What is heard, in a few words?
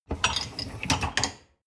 dishwasher upper tray draw out short